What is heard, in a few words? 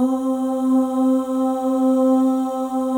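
OH, female OHS, Voices; Symphony of Voices; Spectrasonics; FEM.1 OHS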